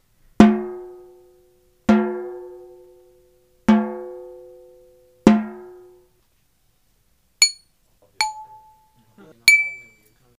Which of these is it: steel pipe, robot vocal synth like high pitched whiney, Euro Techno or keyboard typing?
steel pipe